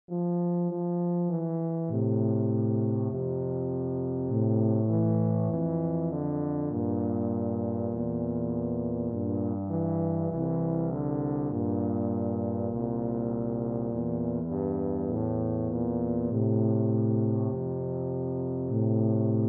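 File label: tubas; duet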